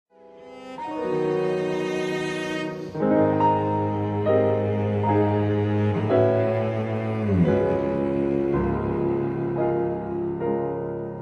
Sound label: Duo for cello piano; Harris